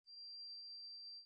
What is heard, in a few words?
trumpet